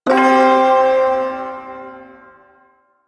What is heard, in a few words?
Ersatz Shawzin Chromatic Chord; Warframe; Duviri Ersatz Shawzin